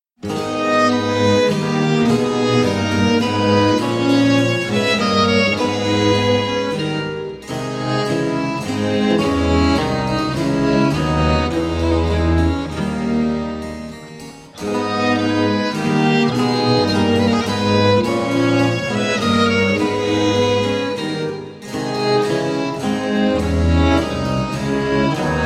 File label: Sarabanda, spoken; Mensa sonora Pars III (C 71); Simplicissimus Ensemble